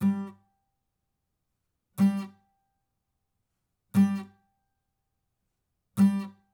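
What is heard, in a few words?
acoustic pattern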